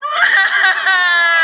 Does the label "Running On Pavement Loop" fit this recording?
No